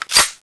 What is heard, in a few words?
reload, weapons; buff aug; guardian aug; cstrike